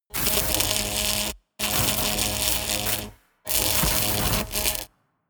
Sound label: spark